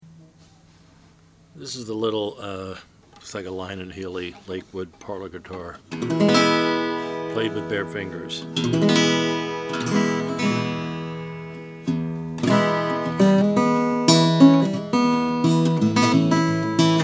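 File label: lyon healy the lakeside natural, Guitars; 1915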